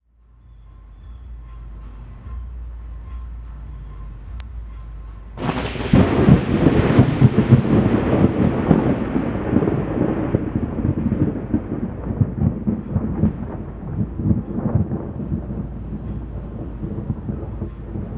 Weird Thunder Clap

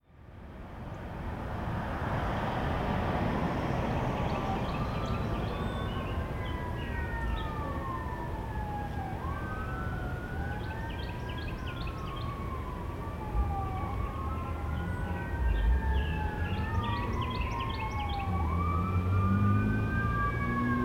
firetrucks

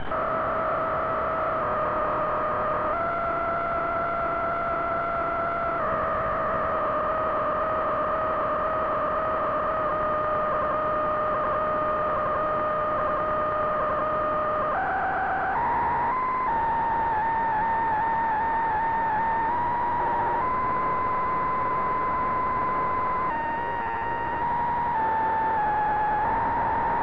unknown noise

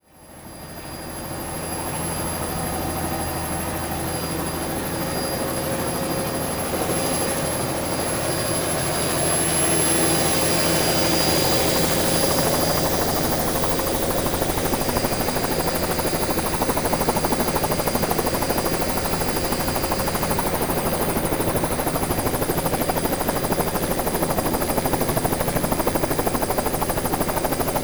helicopter

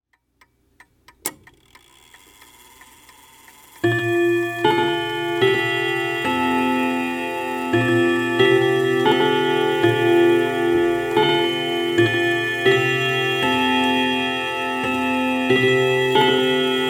Clock Before Service

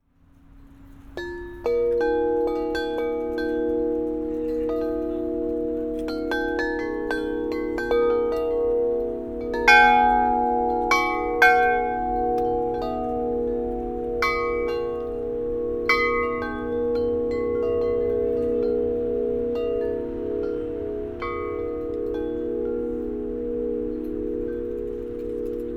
Corinthian wind chimes

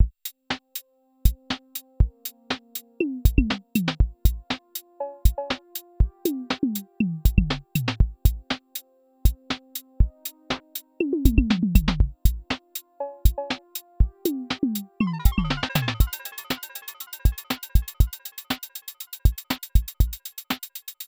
drums

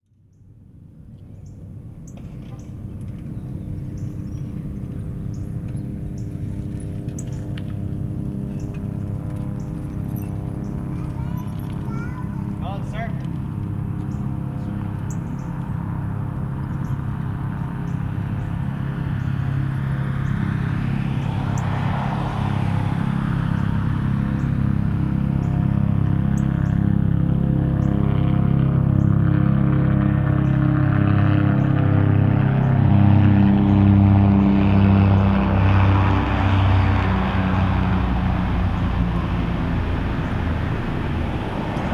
fly-by

